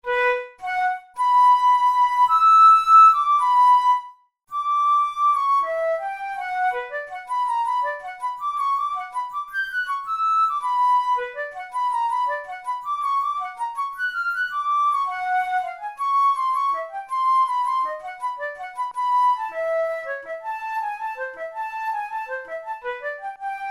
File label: melodic exercises, flute tunes